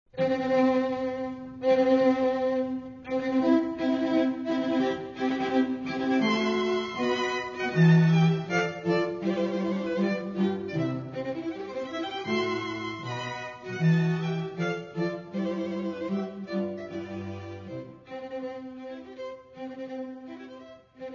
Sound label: Quartet wedding march; mendelssohn